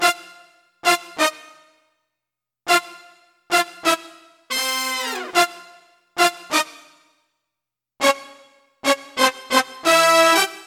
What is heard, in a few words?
brass; maudio; Latin Element